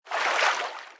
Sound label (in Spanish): nadar